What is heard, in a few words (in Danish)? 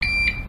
kredit kort scan